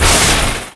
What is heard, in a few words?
light flares; zik